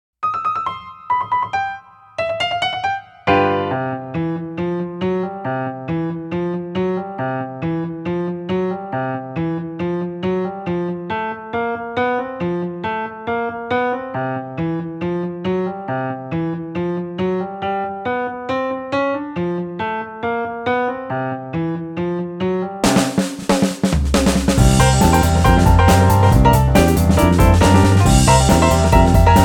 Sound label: Kids Blues; gekiban